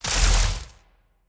Conductor Crystal Turn Off; TANK; 3Souls

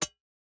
environment pickaxe hit iron

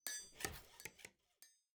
block upgrade building

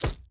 object drop bait

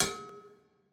ambience room polygon hammer training

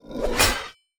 butcher strike

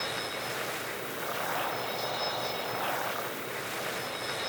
Orbital Spikes Fly; TANK; 3Souls

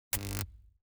zone electricity zap

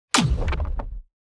building destruction low

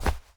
environment object drop textile

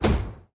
necromancer projectile; Shelter

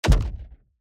environment gold block destruction kick

